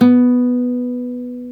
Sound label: GTR nylon; Club-50 - Foundations Roland; Nylon Martin